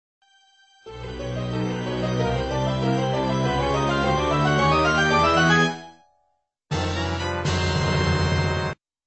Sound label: open chest with get item sound; zelda ocarina of time